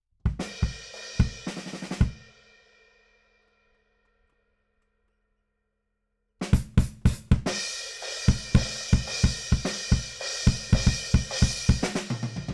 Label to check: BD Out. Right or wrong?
Right